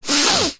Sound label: human sniff hard blocked nose; zapsplat